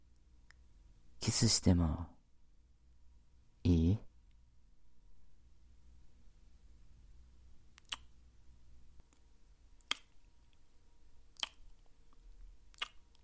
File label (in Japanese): キス